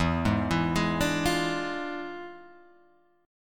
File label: E 7th Flat 9th Guitar Open G, chord